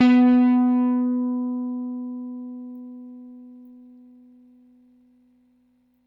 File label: Rickenbacker Bass; Airwindows